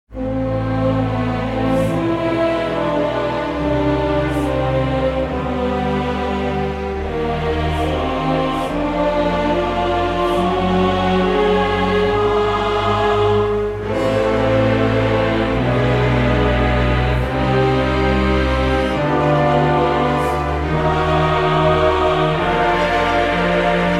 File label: Orchestral